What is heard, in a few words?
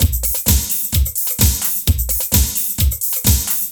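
drum loops, right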